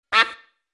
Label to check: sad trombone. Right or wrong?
Wrong